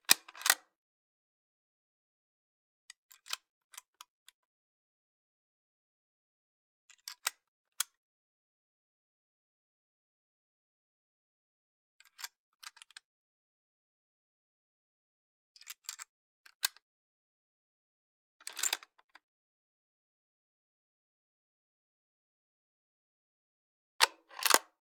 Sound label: .223 Rifle Load, Unload; Hollywood Edge; Heavily Armed